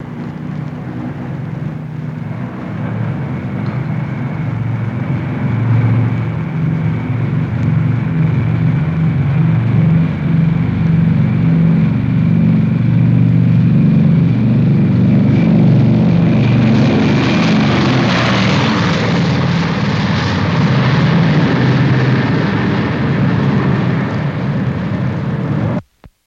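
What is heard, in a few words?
B29 Take Off In Overhead, b-29 superfortress; B-29 Take Off, In And Overhead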